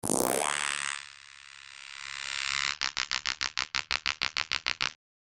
sci-fi growth ray zap

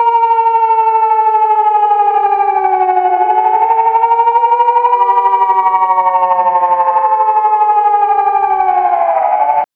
slow down echo from gho, siren

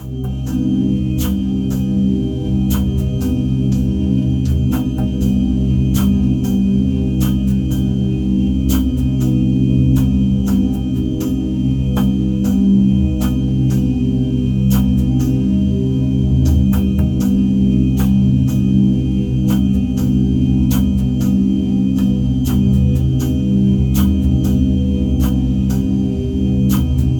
a minimalist and inspirin

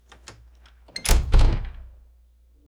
door open

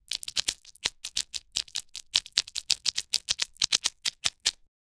rapid repetitive slimy sl

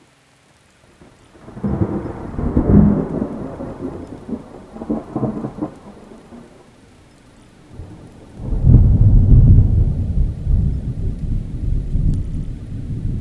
thunderstorm with rolling

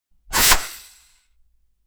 quick strike of a match

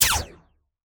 laser rifle single shot

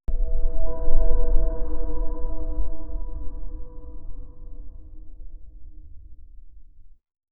low rumble fades in a dist

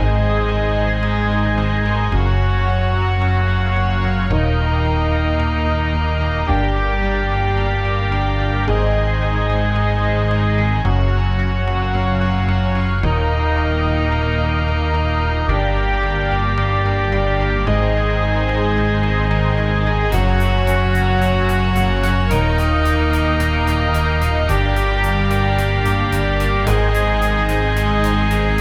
professional news backgro

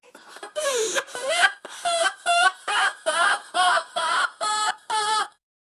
laugh

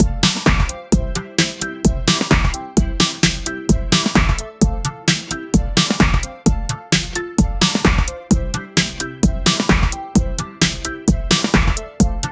station id jingle for new; pop

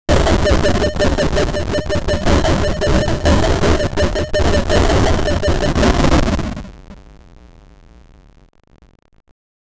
retro game damaged 16 bit

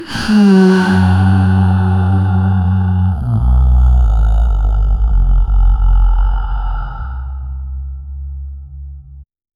deep strained female atta

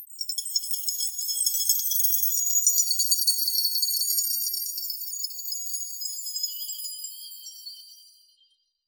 introduce high crystallin

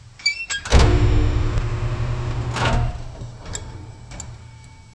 realistic mechanical

